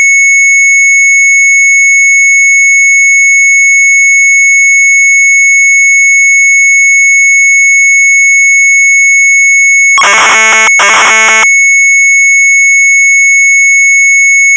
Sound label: MZ800 Tape, sharp; KLOK; retromelsarchive